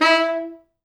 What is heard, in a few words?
E3, Pop Brass; Best Service ProSamples vol.19; AKAI